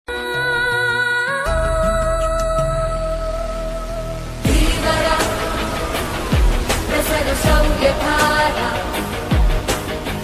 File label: background music